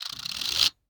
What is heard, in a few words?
Tape Measure